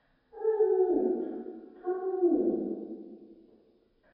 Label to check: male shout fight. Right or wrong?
Wrong